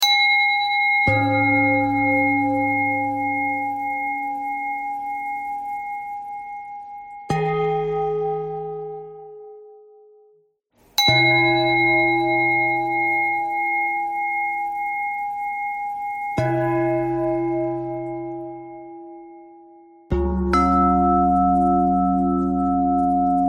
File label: bell thread pentatonic